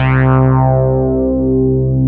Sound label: MOOG; Rubber Chicken Software Sample Bank